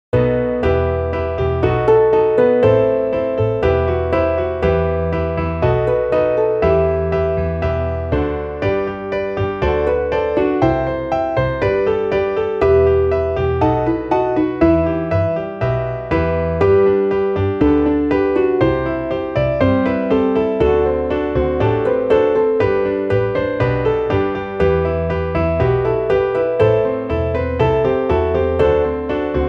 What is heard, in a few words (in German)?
midi mondzecher klavier